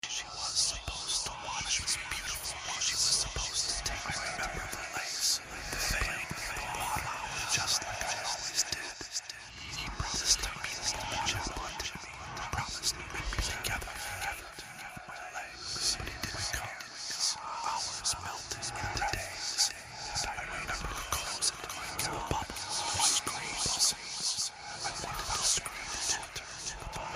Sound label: haunting ghosts whispering horror sound effects